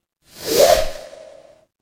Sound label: transition swoosh